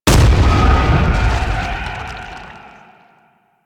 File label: Braid Destroy; Ambience; Queens Chamber; Warframe; The War Within